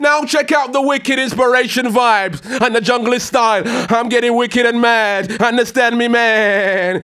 HAL RAGGAM, RAGGA-MUFF A maj

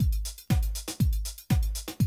120, Classic Drum Loops

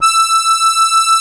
ZUPAN ZUG E3, AKKORDEON; Best Service; Alpine Volksmusik

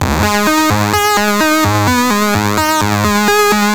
Synth, 128bpm, Nu Dance Electro; Zero-G; Soundclash; Acidized Wavs; Combi Loops

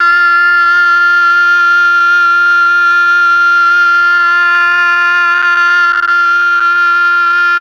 MEL OBOE

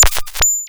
Morse Coder; Cwejman S1; DevSnd Cwejman S1 - JK

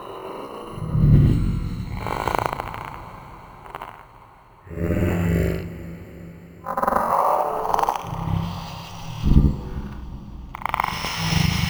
Glop Voice; Sonic Foundry (Sony Creative Software); Loop Noir

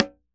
Drums Percussion Congas

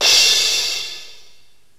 ANALOG COMBO; OMI - Universe of Sounds

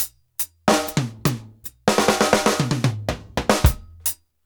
TING LOOP 74; AMG Gota Yashiki Groove Activator

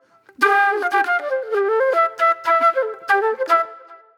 Disco Flute

